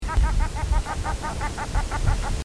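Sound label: shelduck, birds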